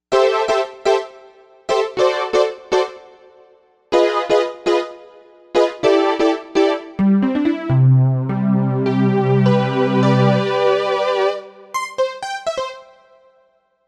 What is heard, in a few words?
Ana Mallet, Novation UltraNova; Factory Patches